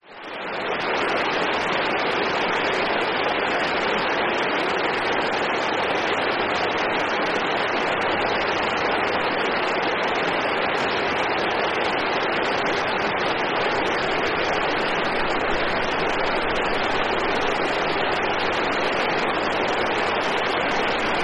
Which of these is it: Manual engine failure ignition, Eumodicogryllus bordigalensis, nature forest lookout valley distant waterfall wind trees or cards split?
nature forest lookout valley distant waterfall wind trees